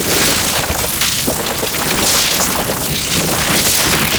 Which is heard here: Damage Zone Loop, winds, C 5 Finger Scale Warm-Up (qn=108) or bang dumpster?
Damage Zone Loop